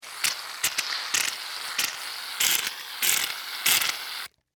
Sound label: Shaving Cream, Spray; Household; Run, Empty; Spraying; BlastWave